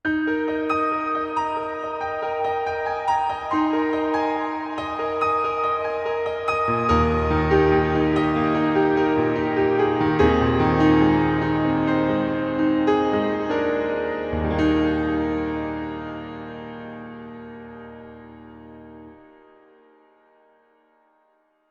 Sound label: Reverb - Piano - Bright Cathedral; CRTIV Reverb